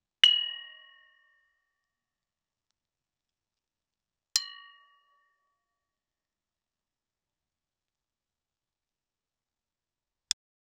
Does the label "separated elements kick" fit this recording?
No